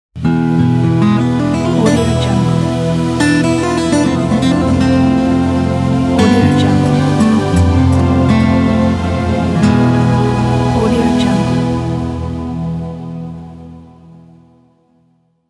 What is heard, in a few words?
spanish guitar logo